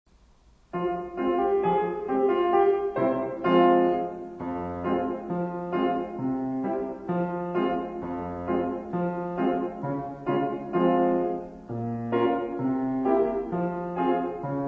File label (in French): L'escargot instru